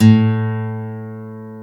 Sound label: GTR, Dual Guitar; Club-50 - Foundations; Paul